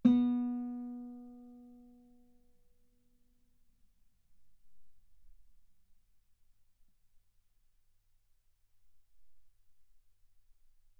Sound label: Guitar B3; ff